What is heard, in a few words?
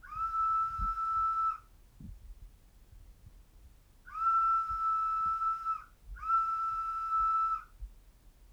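3 whistle courtyard rec cell C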